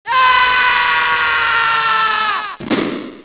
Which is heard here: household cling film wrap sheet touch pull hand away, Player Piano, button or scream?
scream